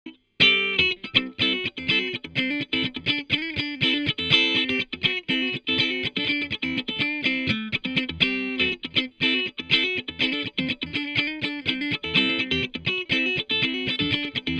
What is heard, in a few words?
Guit Amp Clean; telefunken tf47